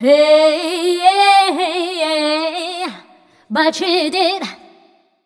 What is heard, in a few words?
f mel f, fem Vocals